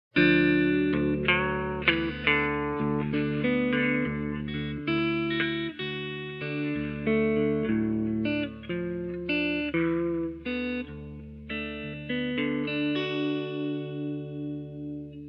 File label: guitar play music; gamerip; The Sims 2